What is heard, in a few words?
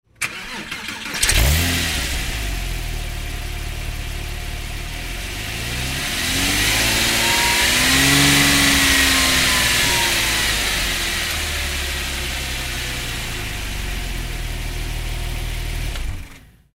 Vehicle